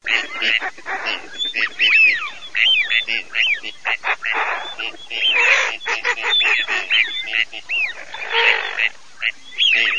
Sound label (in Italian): FISCHIO CANAPIGLIA